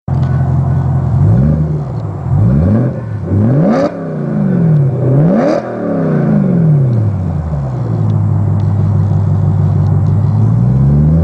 Bmw M3 Custom Exhaus

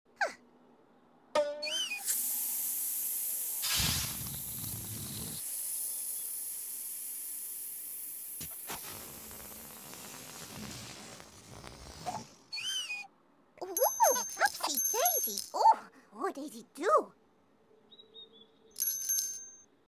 Rude Noises

